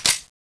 fiveseven slide release; ghost ws; weapons

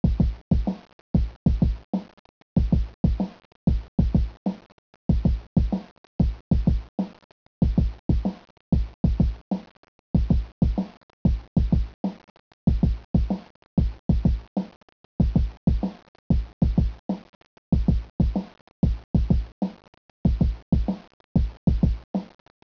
drumbeat; malle apartments

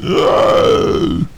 zombie die

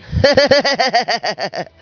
nengo risa

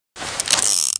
deagle bulldraw, weapons

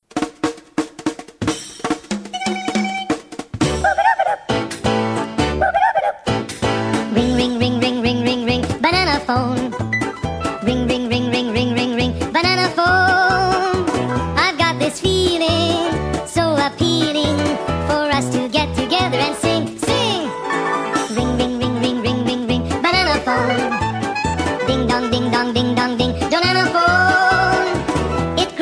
music

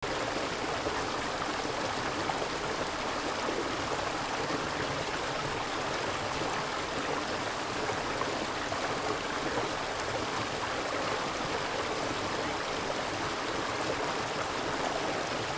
water

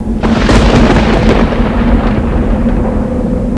thunder; zombie plague

